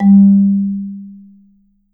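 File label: Marimba, soft bass, G1; Sampleheads - Dave Samuels Marimba & Vibes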